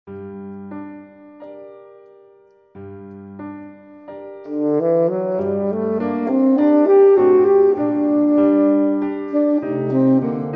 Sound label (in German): tenorsaxophon klavier; hofmeister verlag